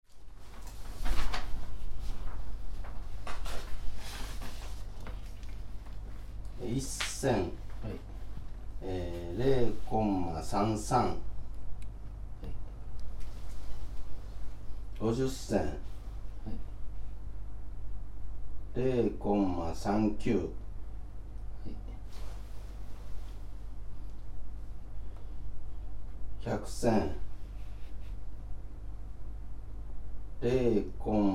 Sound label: measurement; watari